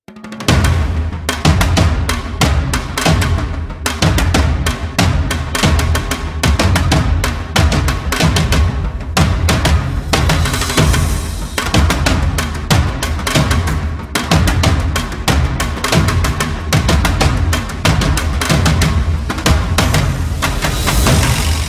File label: Taiko Drums